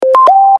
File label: iphone aurora